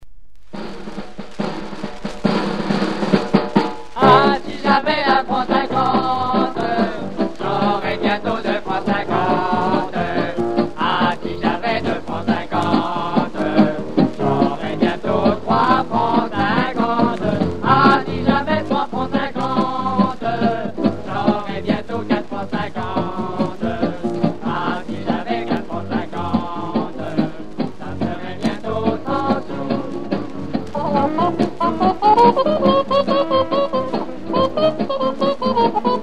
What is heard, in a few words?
Whispering